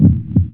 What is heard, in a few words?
se heart